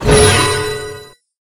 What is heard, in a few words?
TILE SUCCESSFUL COMPLETION; ATLANTIS PUZZLE